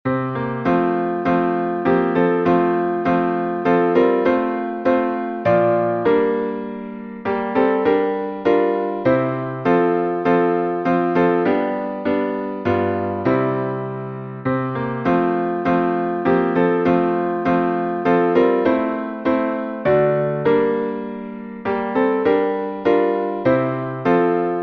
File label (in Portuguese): salmo instrumental